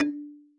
marimba kalimba; ゆめ2っき

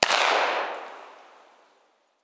gun

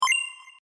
coin get

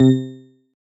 marimba; ゆめ2っき

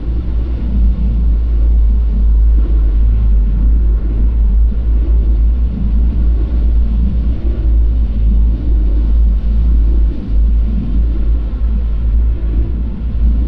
drone; ゆめ2っき

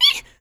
scared squeak; ゆめ2っき

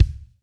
marimba drum; ゆめ2っき

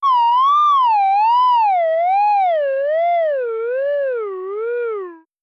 slide whistle

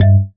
marimba raincoat; ゆめ2っき

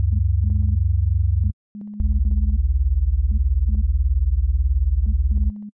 error; ゆめ2っき

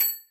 marimba

